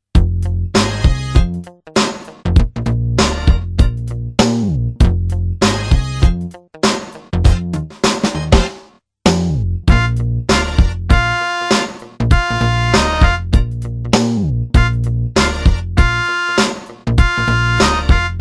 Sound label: note shuffle